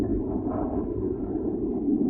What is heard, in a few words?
thruster; Mindustry